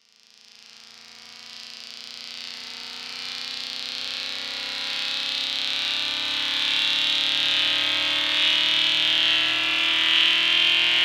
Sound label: Darkpsy Start